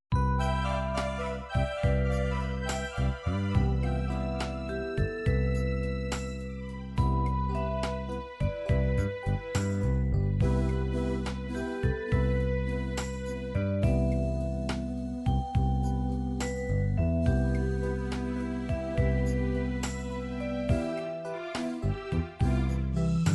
ballads ballad, midi

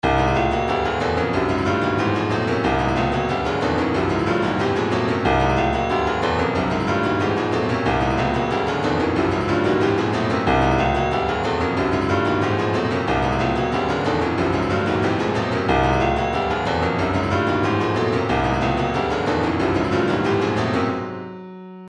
retro funk, midi; trippin

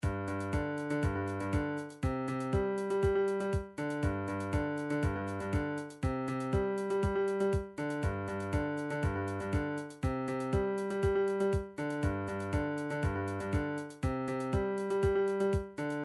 drums reggae

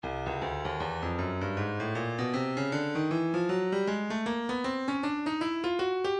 breakbeat 078 bpm; Slithery D; Stylus Breakbeat Midi Files

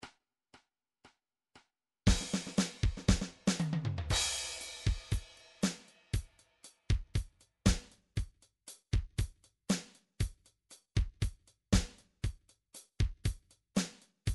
softrock

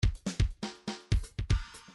grooves funk

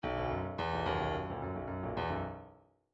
bdf midi file grooves funk s funk s; Pr Funk S

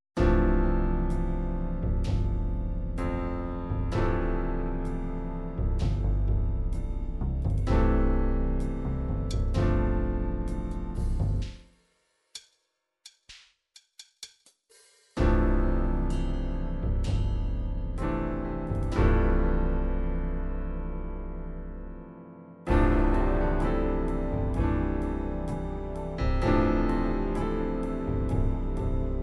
ballads ballad pop ballad, midi

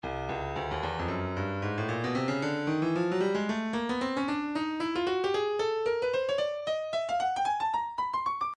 breakbeat midi, 113 bpm; Moth D; Stylus Breakbeat Midi Files

